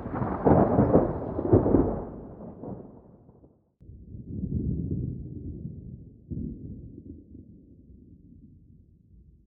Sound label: thunder, ambient weather; Minecraft; Votive World